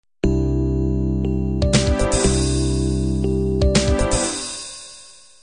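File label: standard 16 beat intro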